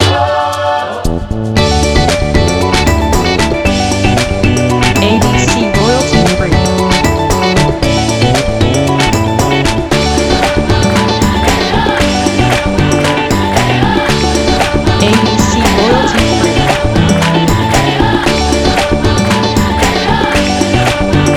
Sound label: Africa Pop